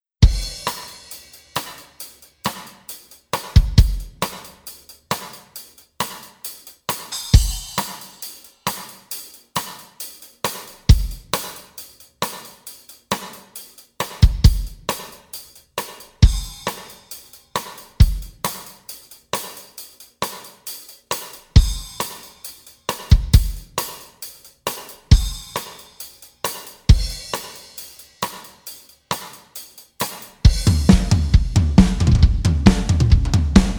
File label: Groove